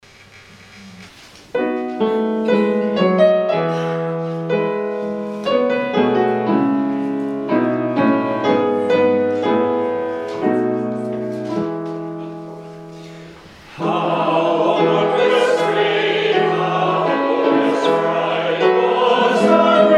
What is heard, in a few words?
Hymn; How wondrous great